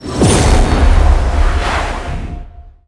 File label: teleport